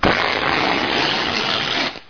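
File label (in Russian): сумка для гольфа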